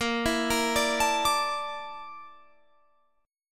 A sharp Major 7th Flat 5th A sharp Guitar Drop D

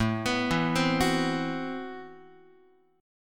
A Minor 6th Add 9th Guitar, chord; Am6add9 arpeggiated; x,5,2,2,4,5; JGuitar

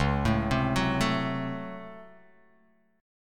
C sharp Major 7th Suspended 4th Sharp 5th C sharp; C#M7sus4#5 arpeggiated; JGuitar

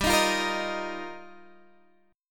G Minor 7th Sharp 5th, Guitar, DADGAD; strummed; Gm7#5

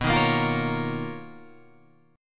B Major Sharp 11th, Guitar, Open G

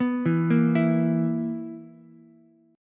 B Major 7th Suspended 4th B down Ukulele; BM7sus4; arpeggiated

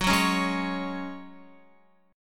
Gb Suspended 2nd, Guitar 4F,4A,5C,5G,5C,6E; Gbsus2 strummed; x,9,6,6,8,9

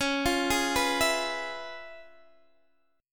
Db 7th Sharp 9th, Guitar, DADGAD; arpeggiated; JGuitar

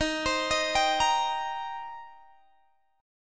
Eb 6th Eb down Guitar Open G

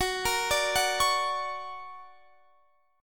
D Augmented Major 7th Gb, guitar

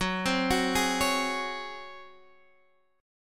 C sharp Major 7th Suspended 4th F sharp

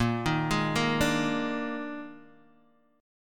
D sharp Major 7th Suspended 4th, A sharp

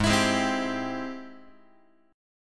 Ab Augmented Major 7th, Guitar; strummed; Ab+M7; JGuitar

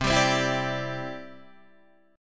C Major Add 9th, Guitar, Standard; strummed; JGuitar

